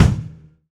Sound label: Kick Rev